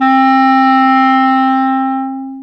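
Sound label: clarinet single fading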